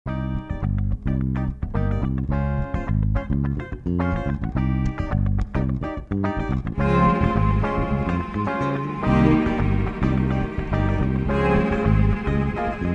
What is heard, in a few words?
Drum'n Bass